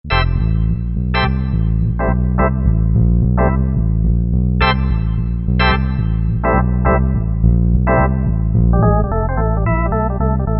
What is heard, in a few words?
Bass Jazz, Korg MSC-9S Organ